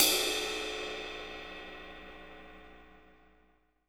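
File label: CYMBAL, JAZZDRUM; Ueberschall; Drum & Bass Flash Trackz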